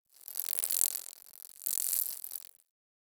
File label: Ext Insect Fly By; Warframe; Infested Eris